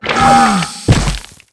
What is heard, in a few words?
Hit; Eft